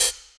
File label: Hhat, HiHat; Equipped Music; Loungin House